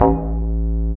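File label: bass, Jungle; E-Lab; XTC Files of Jungle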